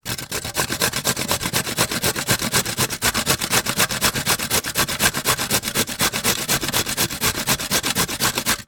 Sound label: food grate carrot; sound effects one